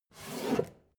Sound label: take book hard